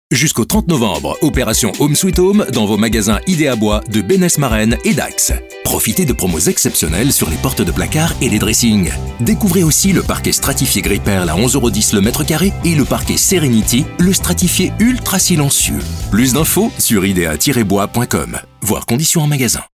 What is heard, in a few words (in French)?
MUSIQUE; IDEA BOIS DAX OCT 2016